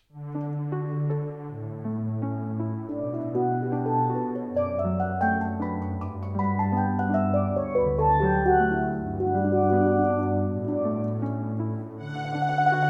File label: Canon In D, steel drum